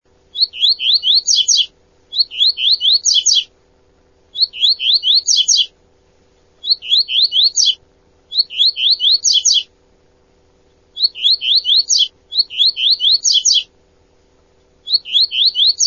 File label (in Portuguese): Som de passarinho